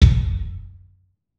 3-D Percussives, LOW Toms

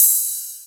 Ride Cymbal

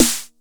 909 snare, drum machines

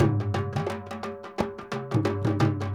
INDIA L, IND ENS PH, Heart of Asia

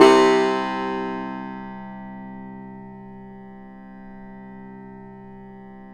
yayli tambur

